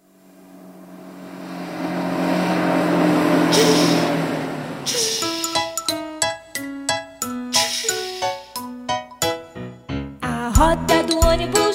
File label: Bus